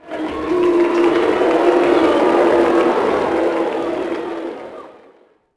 boo, crowd; MX Unleashed

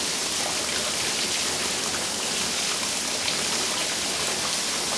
water pour; Star Wars Knights Of The Old Republic I; XBOX

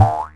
Boing; Luxo; XBOX; Finding Nemo

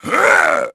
GRUNT; Pulse Racer

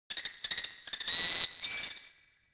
hawk; Ambient; MX vs ATV Unleashed

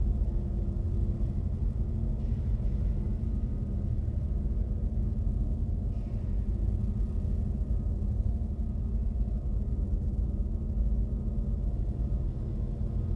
landing ship bay ambience; Star Wars Obi-Wan; XBOX

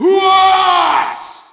Shout, audience; UFC Tapout 2

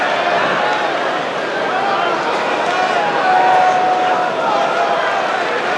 Small Idle, Crowd; David Beckham Soccer; XBOX

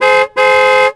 car horn; Tony Hawk's Project 8; XBOX

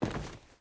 body Get Up wood

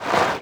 sneaker brake, skater; Tony Hawk's Project 8; XBOX

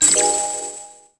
get item

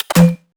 reload m79; weapons; Max Payne

